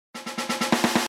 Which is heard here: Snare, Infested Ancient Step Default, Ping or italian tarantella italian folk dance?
Snare